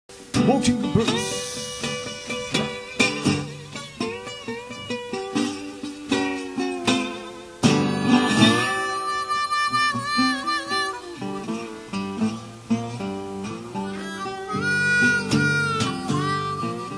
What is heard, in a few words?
walking blues